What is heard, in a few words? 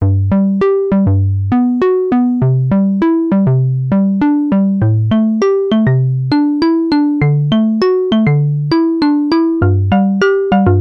Pulse Bell Behringer 2600